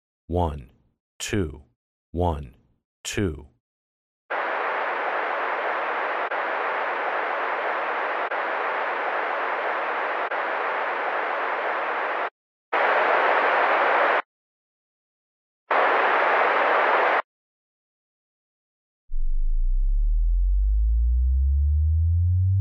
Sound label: Audio Test for Stereo Speakers & Headphones